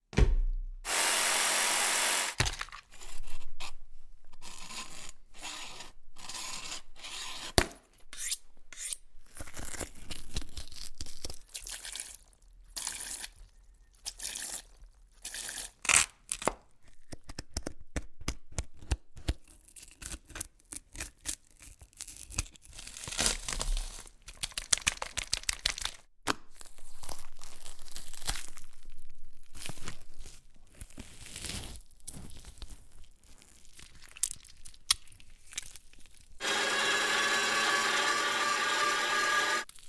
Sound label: Deer